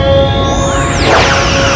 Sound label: phaser